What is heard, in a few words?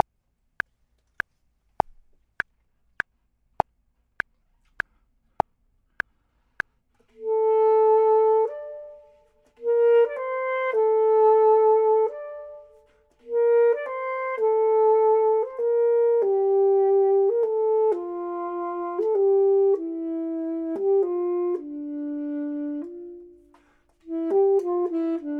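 top, Saxophone and Clarinet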